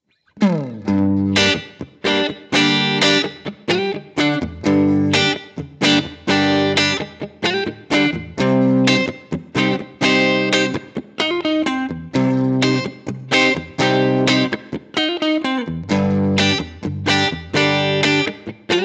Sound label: characteristic sound; jensentone